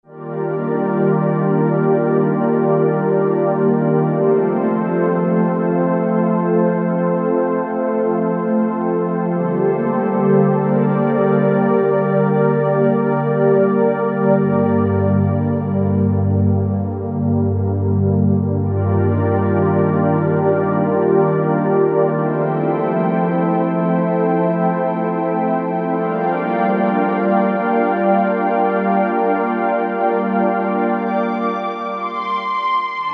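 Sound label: OB Soft Pad, Roland SRX-07 Ultimate Keys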